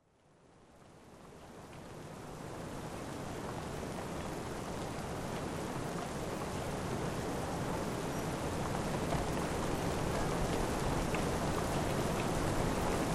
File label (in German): Regen viktorianisches London; 6 Stunden